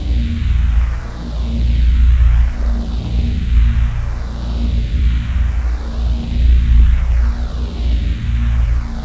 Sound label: background; ULTRA GAMEPLAYERS - Disc 104 - Dec. 1997